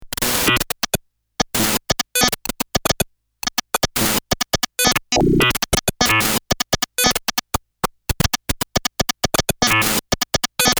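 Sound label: electric yamaha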